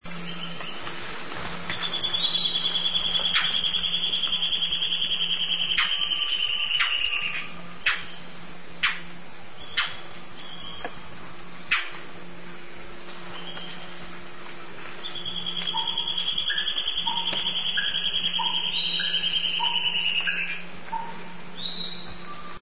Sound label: white browed antbird; Peru